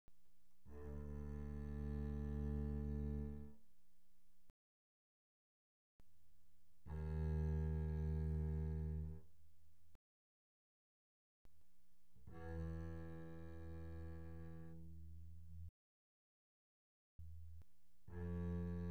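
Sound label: Bass arco pianissimo sul D D2 B2, doublebass; Strings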